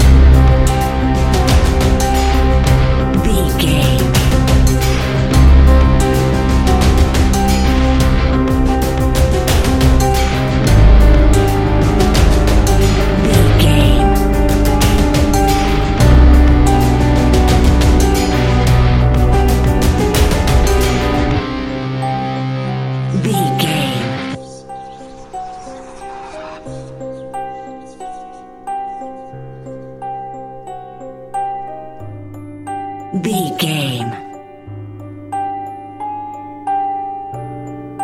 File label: modern horror